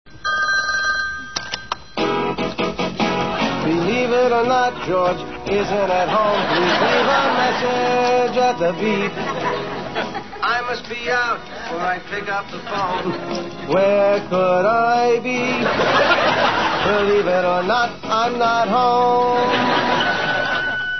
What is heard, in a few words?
answering machine; Seinfeld